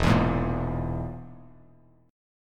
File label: E Major Flat 5th E Bass Standard, EMb5 chord; strummed; JGuitar